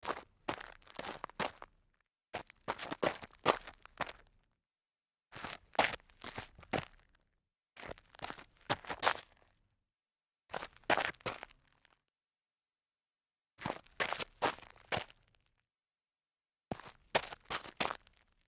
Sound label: sneaker sidesteps rough grit, foley